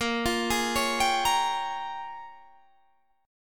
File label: A sharp Minor 13th A sharp, Guitar chord